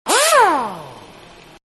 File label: Air Wrench Medium; Power Tools